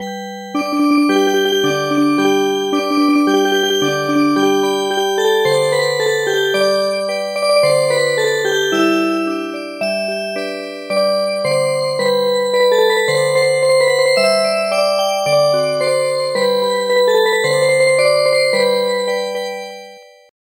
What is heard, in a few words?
Music Box, traditional; The housewife